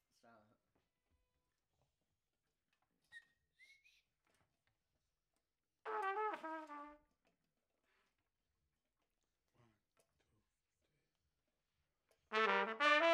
Trumpet Room

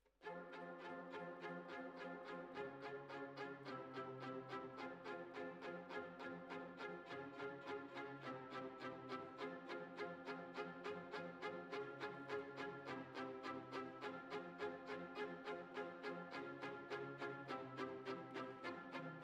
Violins, jazz for piano

